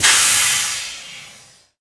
jumpmod boost

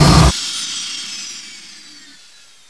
jetpack; jailbreak

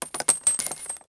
bullets fall

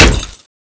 water knife hit wall

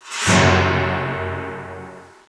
fail

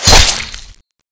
acid trap knife hit

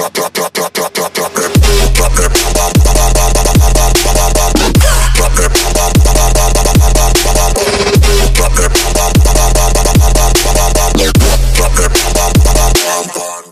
electro; roundsound

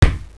pb splat; jailbreak

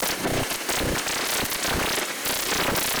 static glitch; cstrike; D26 slender

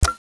water knife draw

